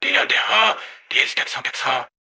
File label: jingle